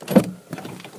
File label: door opening